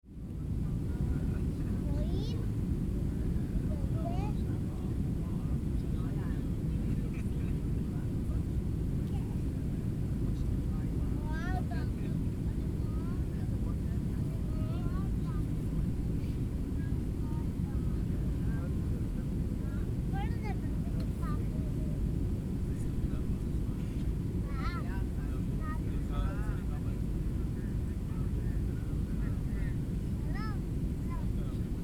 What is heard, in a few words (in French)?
enveloppement AirPlane Enfants